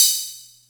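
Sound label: Atlantex Music Percussion Computer hi-hat open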